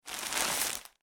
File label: Food Bread Wrapper Open Loaf; Household